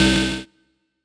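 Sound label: Rave Synth, Dance Mega Jungle Rave; Best Service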